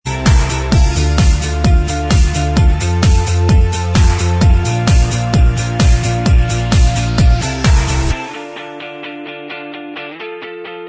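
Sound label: Instrumental Track, backing tracks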